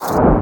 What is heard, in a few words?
Void Exit; Warframe; Focus; Operators; Operator Wraith Movement